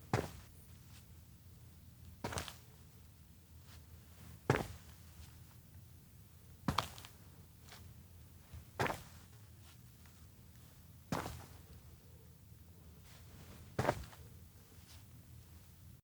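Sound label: steps outdoor main street slow walk cow pace; anemoi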